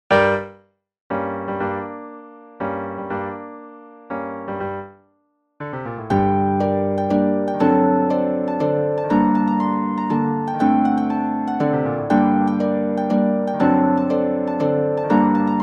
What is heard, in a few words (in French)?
Harpe; Grand Concert pour la Harpe